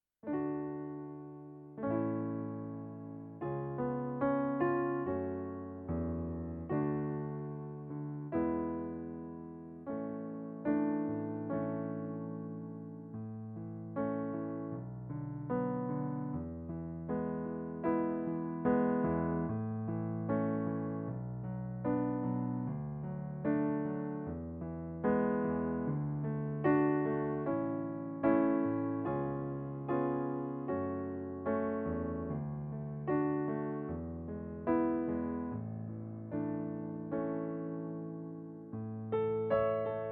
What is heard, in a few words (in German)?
Playback A-Dur